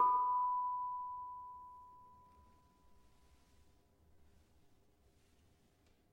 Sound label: Barung 5 B, gamelan tuned